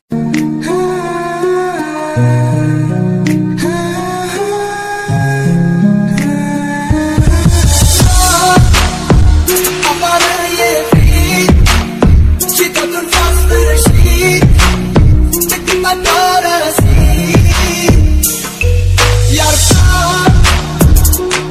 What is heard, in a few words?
sad ringtone